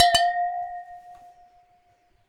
Water Clink, Glass Clink In Water

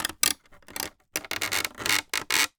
Polystyrene Creak

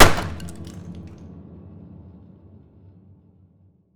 Key Smash, Piano Destruction

